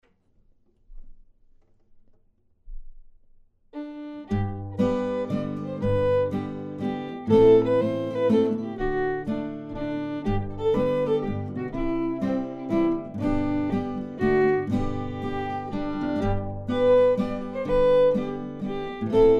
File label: ash grove waltz